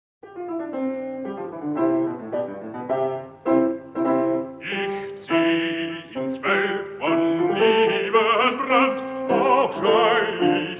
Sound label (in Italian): Lied per voce e pianoforte; Des Kriegers Abschied